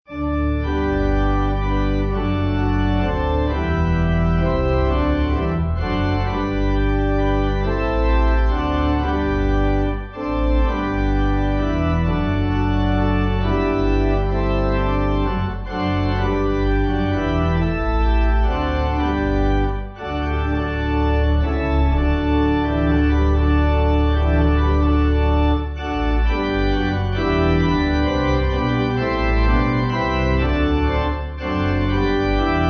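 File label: Pipe Organ, Music Backing Files for Churches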